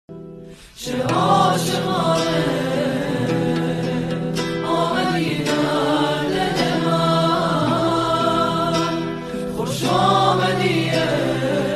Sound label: music barfbazi shad